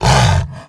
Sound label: Demon hurt small; Boss Demon Lord; Dungeon Defender; DunDef